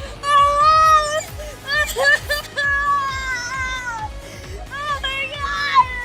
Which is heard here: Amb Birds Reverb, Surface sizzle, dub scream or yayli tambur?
dub scream